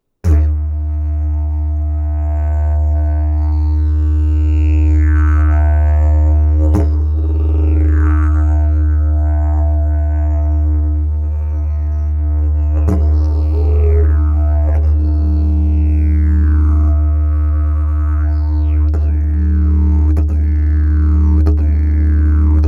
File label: didgeridoo